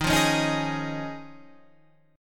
D sharp 7th Suspended 2nd Suspended 4th D sharp, Guitar, Standard; 11,8,11,8,9,11; strummed; capo=8